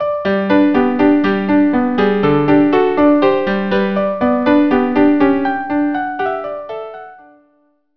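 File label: violin viola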